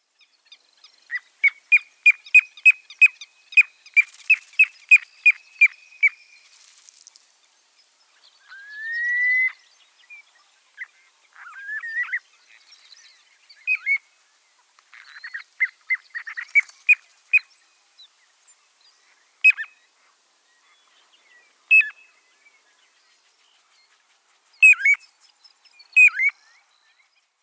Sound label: Charadrius melodus; Chordata